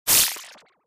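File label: Wash HF Liquid Shimmer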